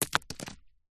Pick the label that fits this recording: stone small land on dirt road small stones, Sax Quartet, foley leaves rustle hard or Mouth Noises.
stone small land on dirt road small stones